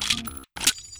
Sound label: ar2 reload, weapons; npc